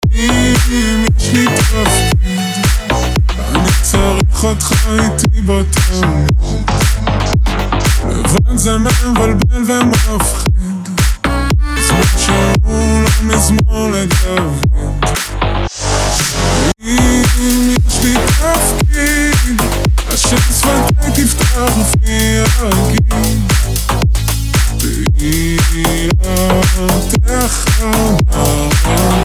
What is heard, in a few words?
remix